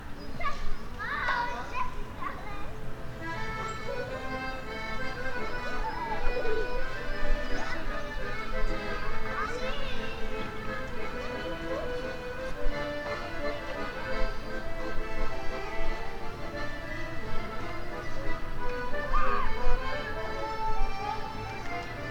accordeon; contades

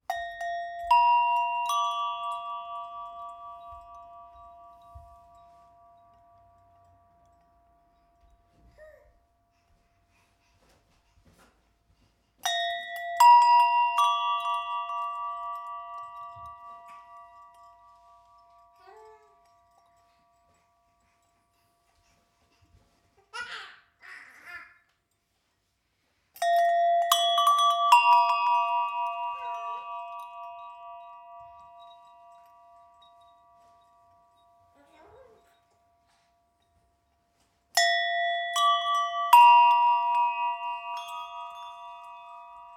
cloches; cloches lucie

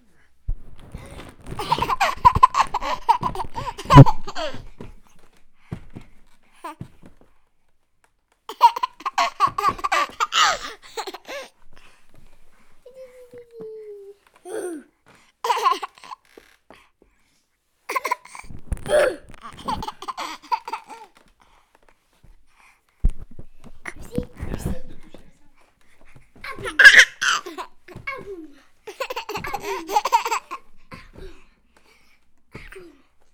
toucher au micro

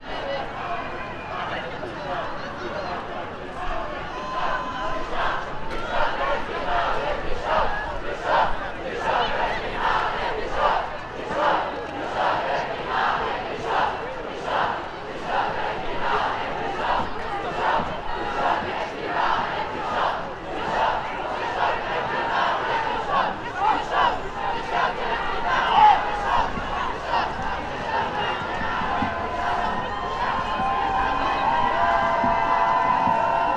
manif climat

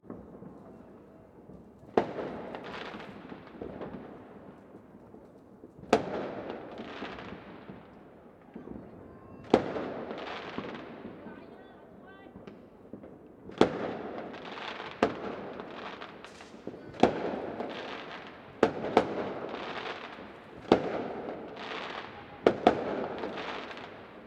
feu

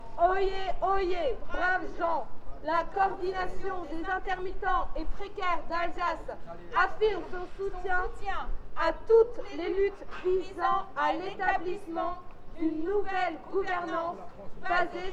manif

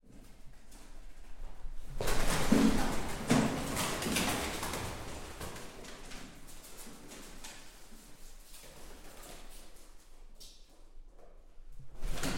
pigeons; terezin

